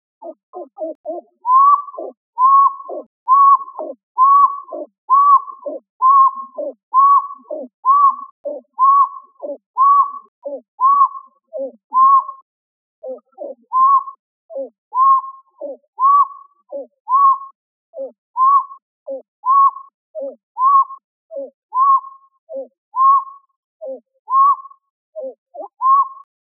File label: Pied-billed Grebe; The Pied-billed Grebe is almost always found alone or as a solitary pair on freshwater ponds, quiet streams and marshes; Ask A Biologist